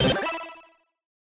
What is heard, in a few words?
Loading Ready; Game rip; MiSide